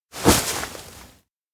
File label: surprised turn; spica; anemoi